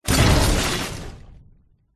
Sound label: impacts smash break heavy wood glass; zapsplat